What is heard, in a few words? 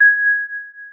celesta, programmable speaker